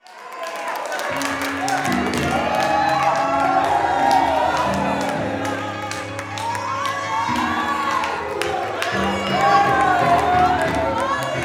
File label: GO2CHURCH, Vocal Planet Gospel; Spectrasonics; Vocal Planet Vol.1 Gospel; akai